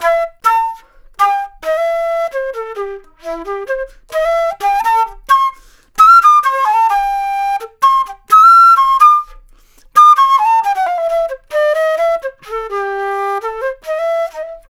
Flute Solo, Jazz; Sonic Foundry (Sony Creative Software)

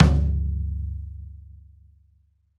Rock Tom, Roland BK-5

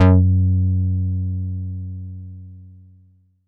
Italiano f#3, Korg Poly-800

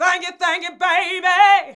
VOX VOX; Sound & Vision - Gigapack II CD 2

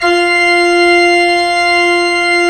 ORG P. ORG; Roland - LA Composer vol. 1

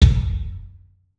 3-D Percussives, LOW Toms; E-MU Producer Series Vol. 5 – 3-D Audio Collection

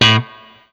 BLU POP, Guitar & Bass; 90 s Sample CDs; Quantum Leap - Guitar & Bass

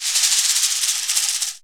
Ethnic Perc, Percussion; Dance Synths

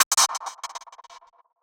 Gated Cymbal; musicradar

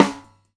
Hyper Snare; Airwindows

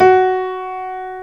BOSENDOR F#4; East West - Global Sounds

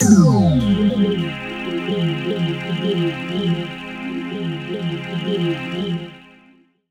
FX Pad; Zero-G Jungle Warfare 1, 2 & 3; 90 s Sample CDs

